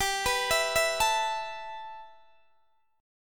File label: G 6th Add 9th G, Guitar DADGAD